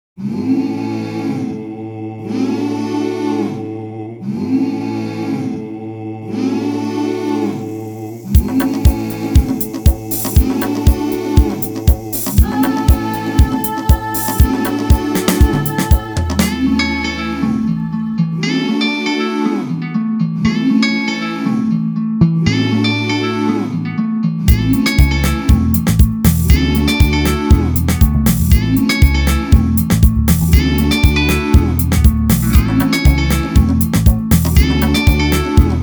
Groove; Anger Music Library

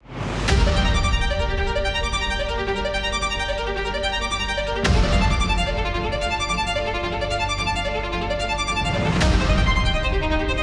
Strings Ex Machina; Anger Music Library

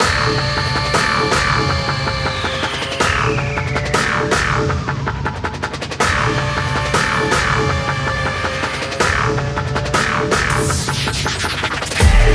Going Down To Hell Inst; Anger Music Library

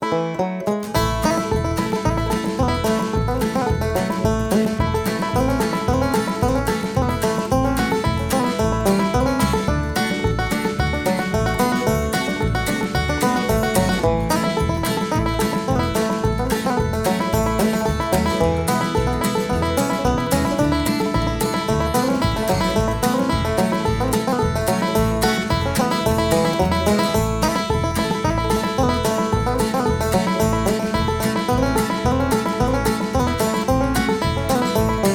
Carolina Country; Anger Music Library

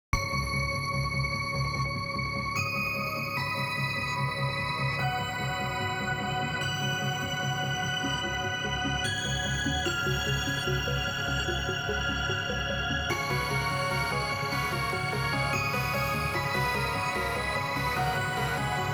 The Cosmic Engine Chorus; Anger Music Library

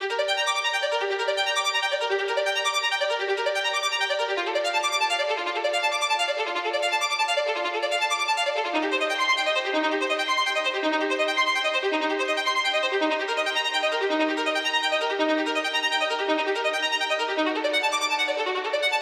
Strings Underscore; Anger Music Library

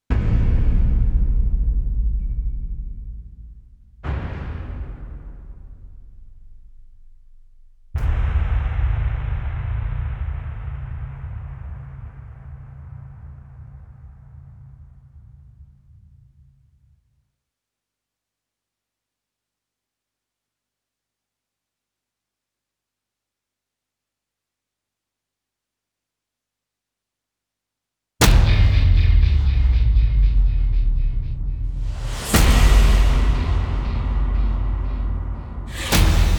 Veni Vidi Vici Drum Mix; Anger Music Library

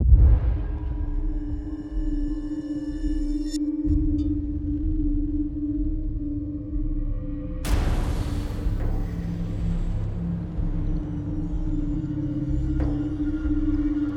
Cracked, Horror; Anger Music Library

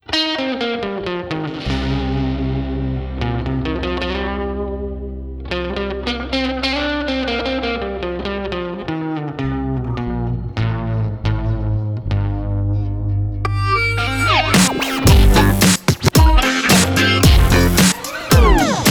Mississippi Breaks; Anger Music Library

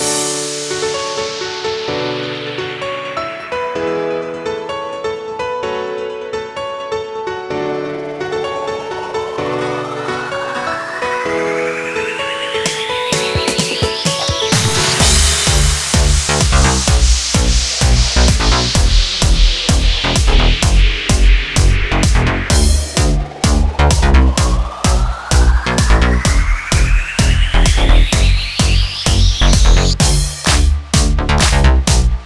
Instrumental; Anger Music Library

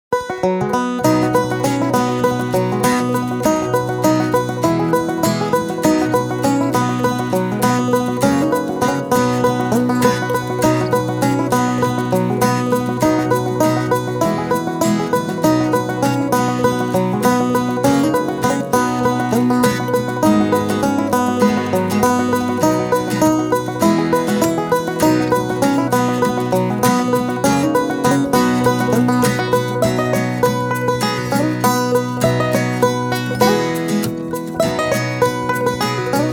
Carefree Country; Anger Music Library

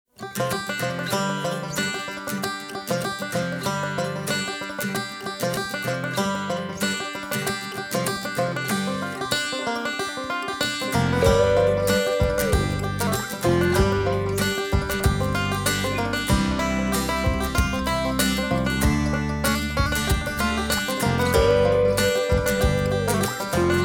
Sunset Country; Anger Music Library

